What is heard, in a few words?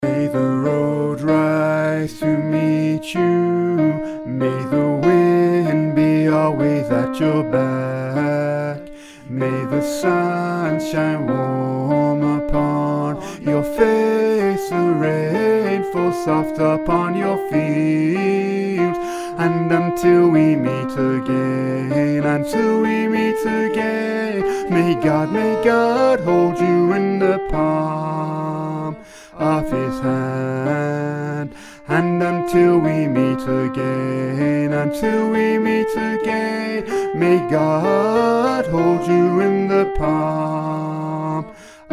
An Irish Blessing - alto; classical-traditional